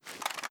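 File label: ar reload empty movement, weapons; gmod; viper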